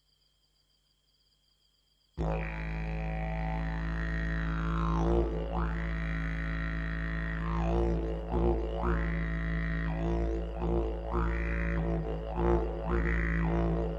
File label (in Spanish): Didjeridu, Audio del instrumento